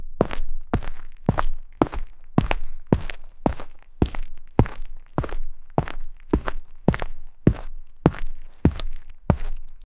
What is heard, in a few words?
footsteps, far